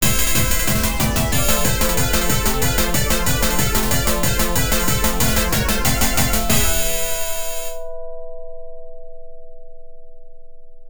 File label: Game Clear; soldier girl amazon terra cresta arcade